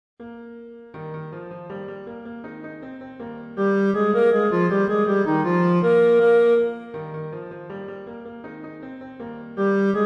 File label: Act I Finale Sixth Section 4th Chorus Entrance Tutti; grandduke; Cue 4: "For this will be a jolly Court"